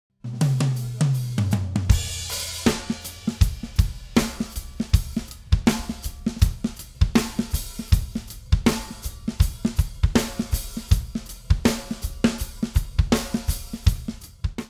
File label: Drum Buss Unprocessed